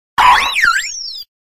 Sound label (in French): Cri Céleste; Pt; Catégorie:Cri de Shaymin; Catégorie:Cri Pokémon (Platine)